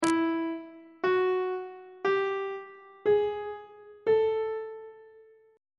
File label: d double sharp 3rd intervals on treble clef